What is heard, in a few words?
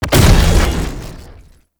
Tank Ram Ability Hit; Warframe; Lasrian Tank; Abilities